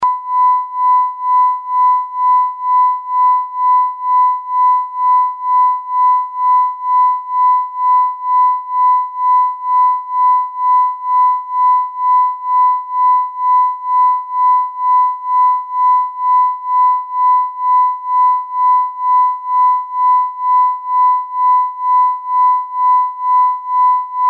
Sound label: Amplitude Modulation